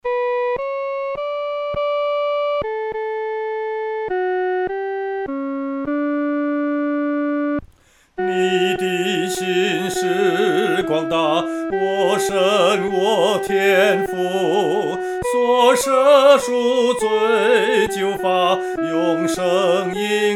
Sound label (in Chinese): 独唱 第一声